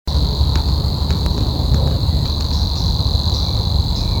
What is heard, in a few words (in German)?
rohrschwirl; vögel; rufe vögel